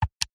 games console xbox controller a button press single; technology; zapsplat